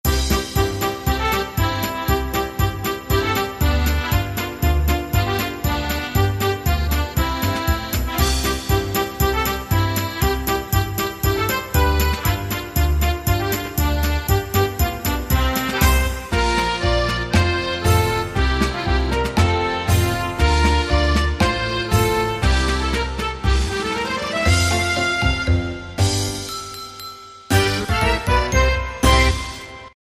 Christmas Polka

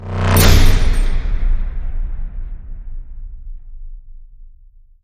Hit Bolt Caliber Ejection

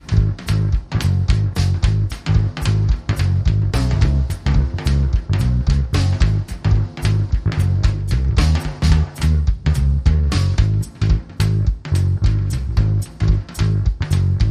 Jive Bass Go Round

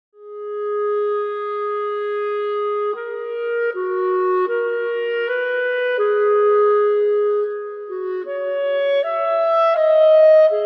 Only Melody

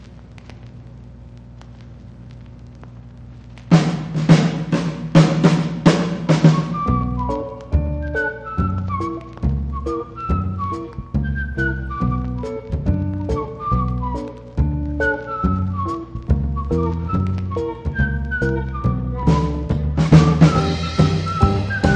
Instrumental

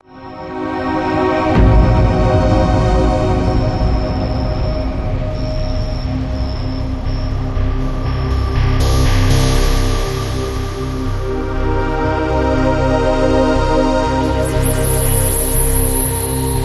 Atmosphere

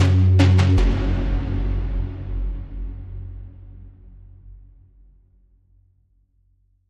One Shot Ending

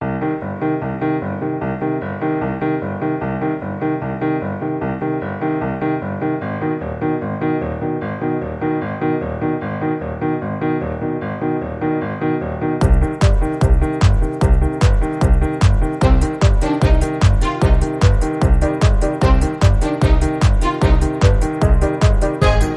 Modern Percussion

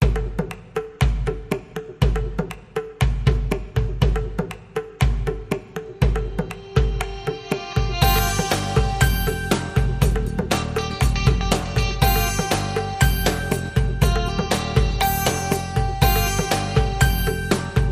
Bhangra

